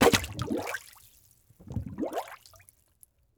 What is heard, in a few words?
Liquid Water Water Plastic Cup Shoved In Water